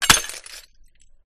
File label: Glass Shards Smash Medium Impact Large Glass Shard; Skyes Audio